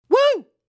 Whoo

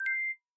Item Sparkle

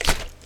Water Bottle Shake Shimmy

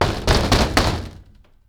Door Wood Glass Bang On Hits

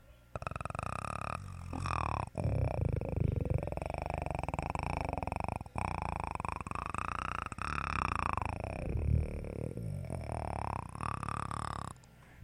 Predator Noise

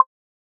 Pock

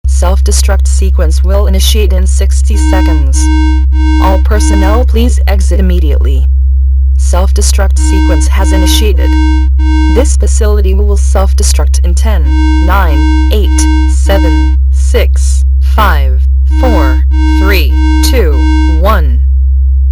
System Failure Self Destruct Warning

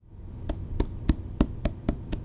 Paper Stack Pat

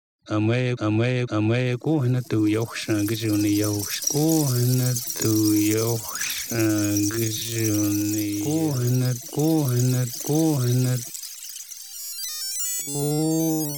Cherokee Rainstick Manipulated

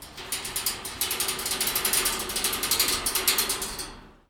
Spoon On Grid Fence; Zoom h2n xy

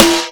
Augmented Noise Snare

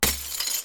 Glass Smash

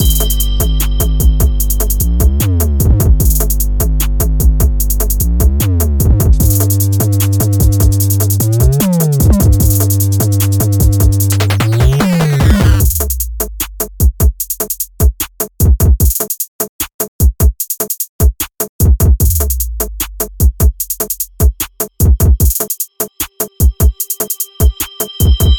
Trap Loop

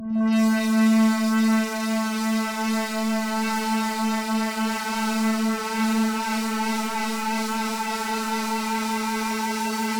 Oh yeah drone

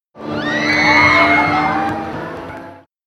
Scream Ride Start

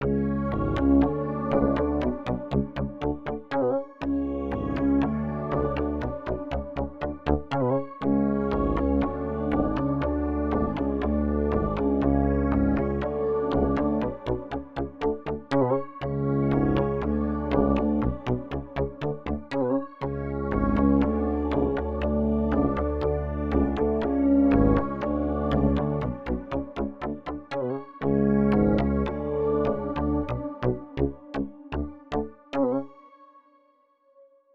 Bass Bassline

